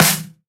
snare drum sound d sharp key; snare drum samples

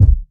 studio kick one shot C key

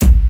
raw steel kick drum b key; kick drum samples

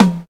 high floor tom one shot f sharp key; tom drum samples; one shots

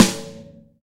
sparkly steel snare drum f sharp key

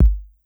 deep kickdrum sound g key

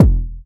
bass drum sample e key; kick drum samples

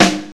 dry acoustic snare d sharp key; snare drum samples; one shots

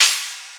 subtle reverb open hi hat sound e key

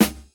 2000s Clean Snare Single Hit D Key

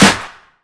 wet clap sound g key; percussion; single hits one shots

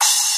dark tone open hi hat one shot a sharp key

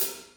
clicky pedal hi hat g key; tuned drums

